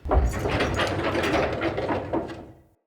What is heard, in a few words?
Archway Gate Door Open; UnderCity